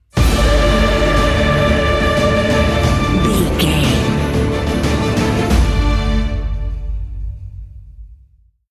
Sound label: epic cinematic sting bumper